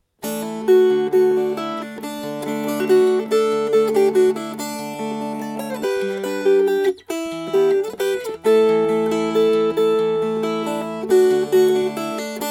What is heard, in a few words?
Fingerpicked